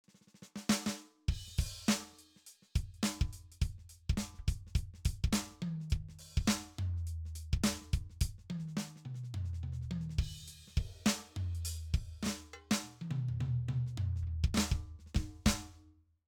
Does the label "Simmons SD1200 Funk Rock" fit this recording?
Yes